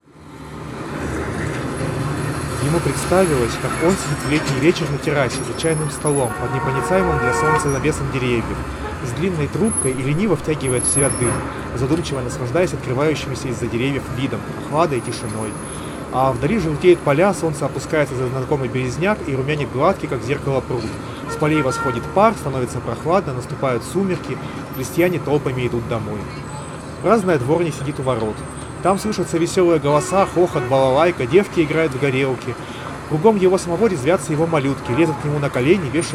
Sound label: Recorder noise; realme buds t200